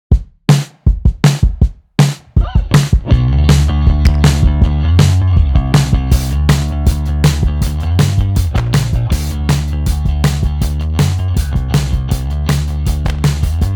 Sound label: Punk Electro; OF FATHERS AND SONS – Soundtrack